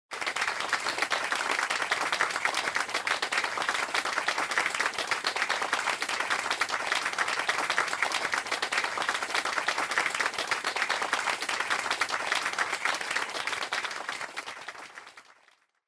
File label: Applause Long